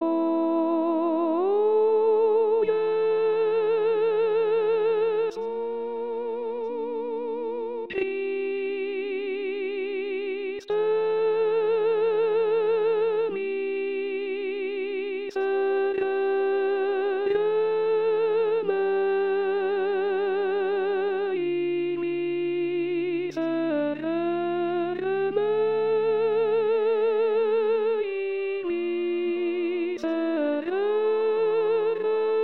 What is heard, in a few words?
O Jesu Christe Contralti; pasqua